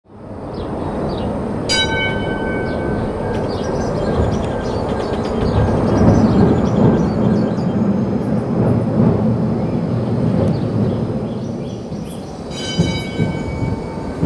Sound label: Trolley